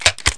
GUN CHANG; REDNECK; RAMPAGER

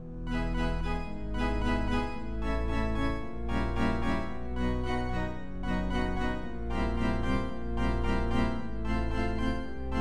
Beethoven Bells

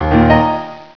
Acoustic Piano Diddy

